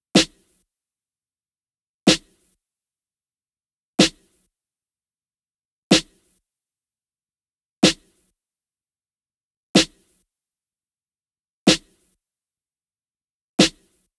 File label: 125 Snare